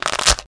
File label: bod strk, bugs; Starship Troopers